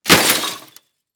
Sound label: Impact Broken Television Drop On Concrete; Impacts